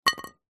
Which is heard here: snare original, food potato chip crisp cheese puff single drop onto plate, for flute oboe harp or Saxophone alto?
food potato chip crisp cheese puff single drop onto plate